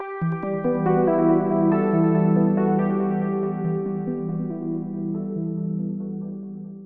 PHRASE Ghost - 140 BPM E Min, Ear Candy; Cymatics; ANALOG EVOLUTION Beta

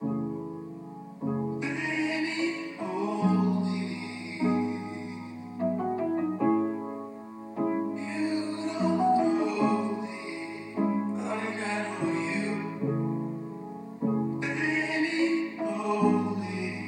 150 BPM E Maj, Melody Loops; Cymatics; Orchid Sample Pack

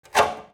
scrape, Cartoon Film Effects

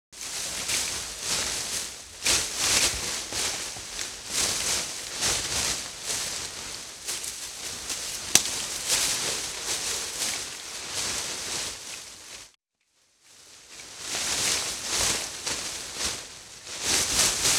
Leaves Bush Rustling; Fairlight Sound Lib

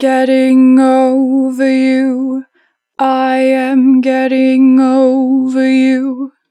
Natural Vibrato, Vocals, 4 bar vib; Getting over; Harmor Vocals

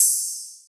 ride, cymbals, drum one shots

